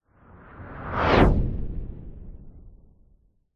science fiction spacecraft super sonic pass by zoom whizz